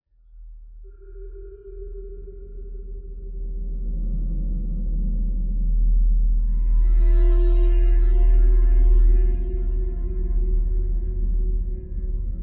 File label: drone